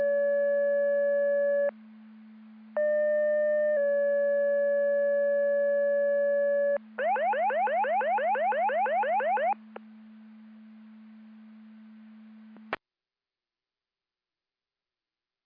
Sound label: Siren; Andover Twp Boro